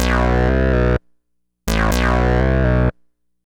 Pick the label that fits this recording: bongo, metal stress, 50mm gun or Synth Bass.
Synth Bass